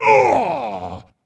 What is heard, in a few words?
Wound; Captain Rupert